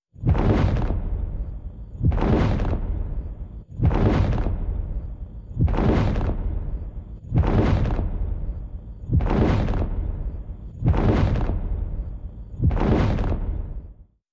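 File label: Dinosaur Footsteps; Animals-Wild|Dinosaurs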